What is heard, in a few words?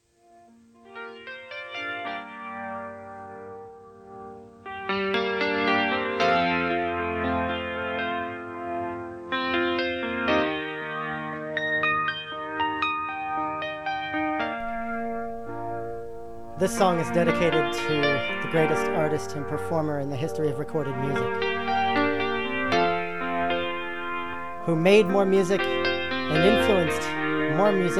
(Keyboard and Strings)